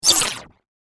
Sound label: Zappy Laser Beam Transition; Laser Sound; science fiction sound effect; star wars / trek style games; Andrew Fleming